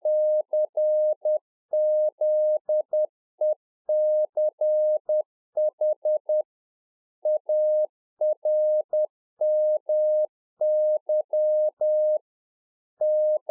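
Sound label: Morse code, 10 WPM; Czech Army Morse Keys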